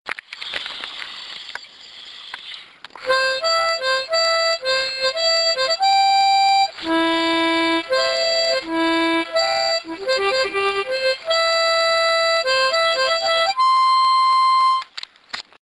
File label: harmonica